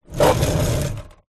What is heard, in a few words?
industrial mechanic garage creeper dolly wheels roll floor